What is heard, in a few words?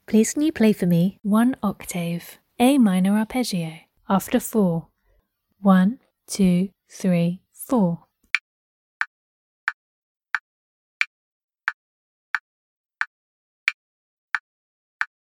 a min arp